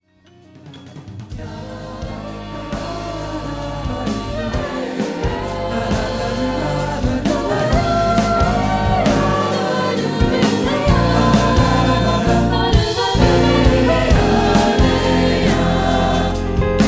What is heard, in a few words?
Embark Ensemble